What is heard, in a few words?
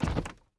Big Man Walk; El Big Man Walk